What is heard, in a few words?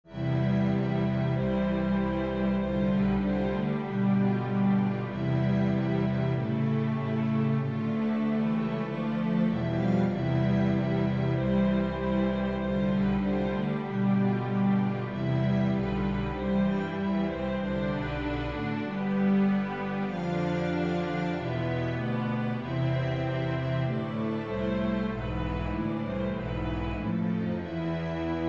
andante; rosamunde